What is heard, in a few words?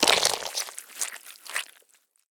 zombie bite; DeadFright